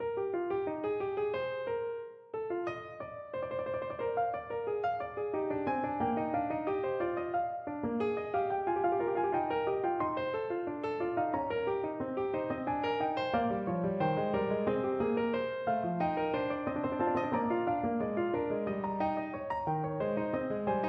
Fugue, piano

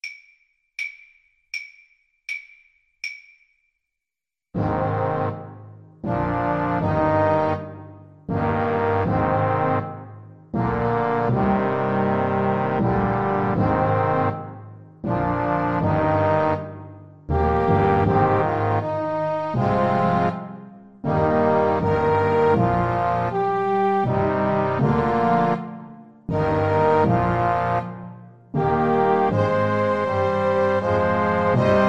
French Horn

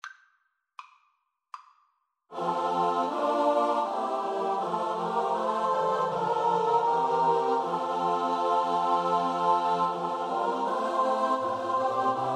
st matthew passion, choir; bach

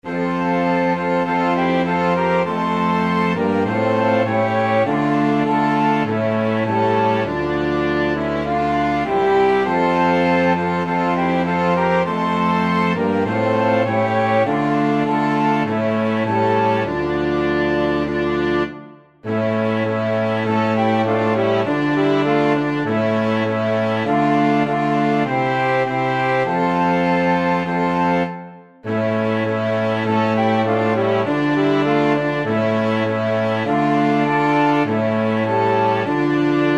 flexible mixed ensemble, 4 players

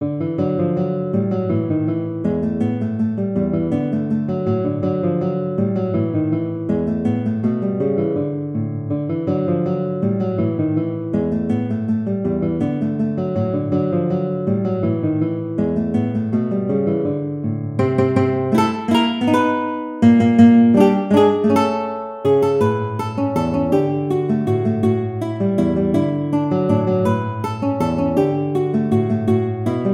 Waltz, guitar

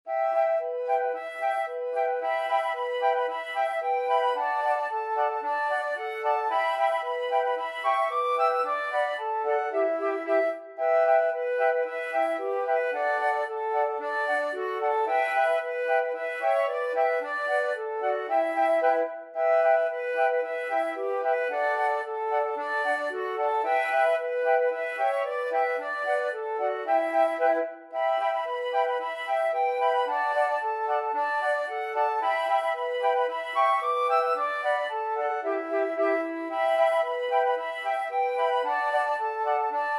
drunken sailor, flute quartet

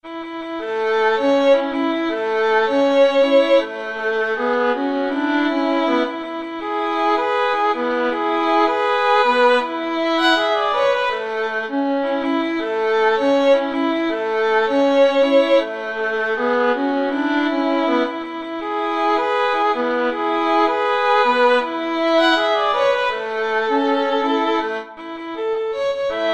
la cucaracha, violin duet

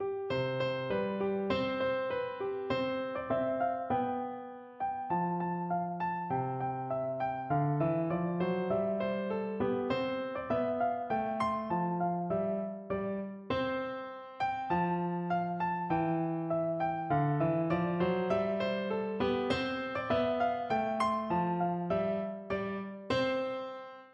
roving, piano

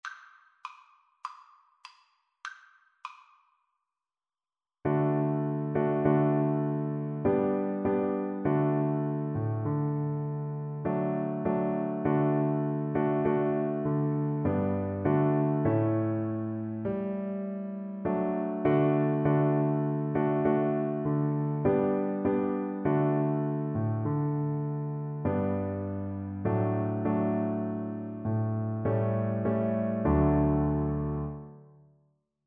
kumbayah, Viola

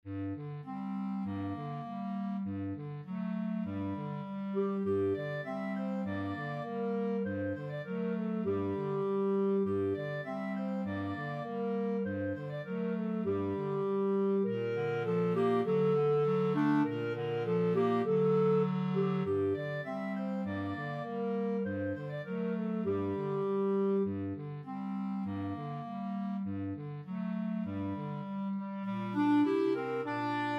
sing we now, clarinet trio

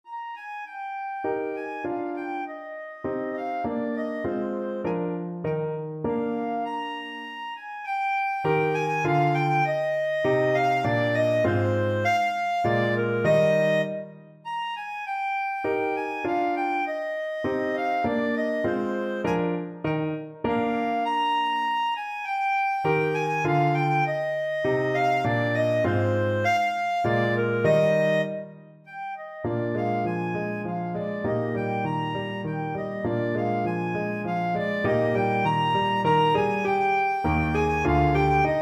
clarinet; mozart three waltzes no2

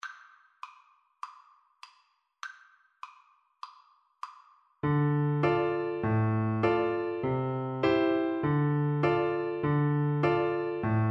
ugros, Flute

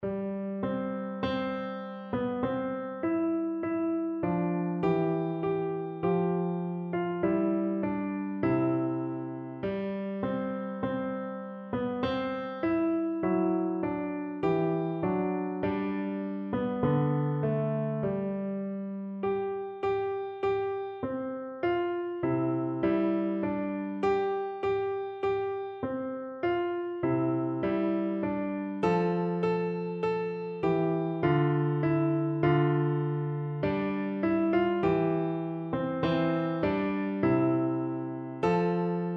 piano

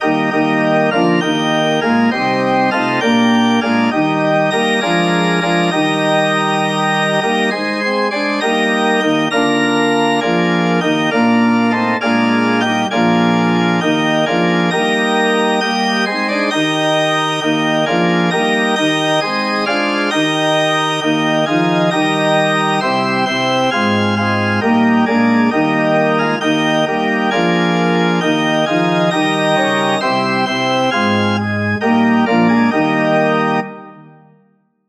organ

